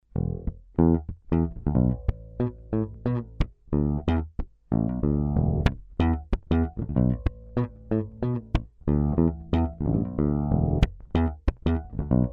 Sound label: grabber pup